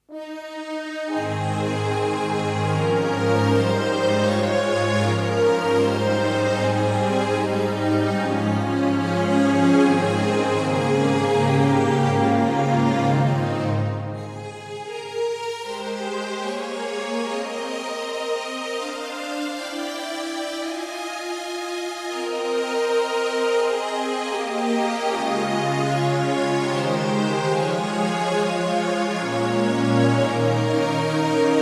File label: Music; Theron's Quest; PC Engine